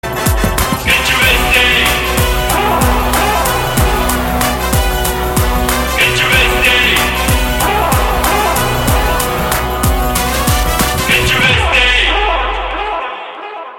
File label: Theme Ringtone